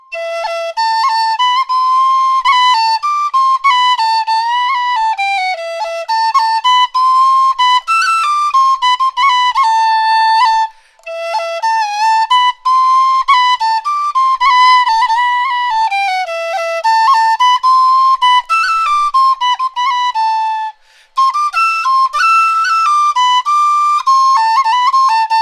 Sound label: Wind Instrument; Assassin's Creed Syndicate